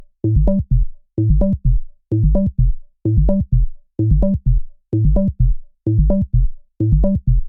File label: 128 Bpm Funk Acid Bass